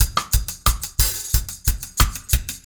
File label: RG90 DRUM, REGGAE